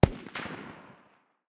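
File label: light machine gun far distance; tacz-tweak-pack; taczmechanics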